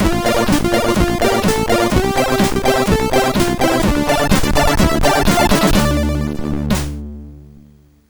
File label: sid MOS6581R4; hvsc